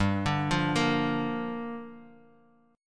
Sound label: G Minor 7th; Gm7 arpeggiated; JGuitar